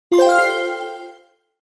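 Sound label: PS5 trophy sound; ps5 zvuk trofeia; soobshcheniia sms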